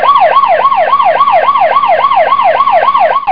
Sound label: JACKPOT